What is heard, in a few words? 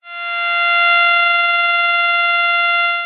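science fiction radio static interference high frequency buzz; zapsplat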